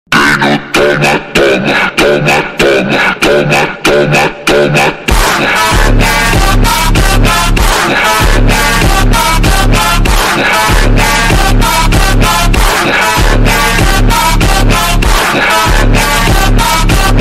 slowed; backrooms

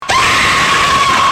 scream

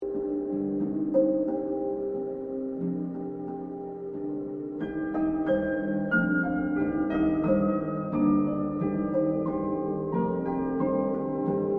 Wise Mystical Tree Music

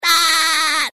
screaming sound effect; SpongeBob

